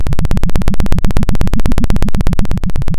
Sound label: Sound of a helicopter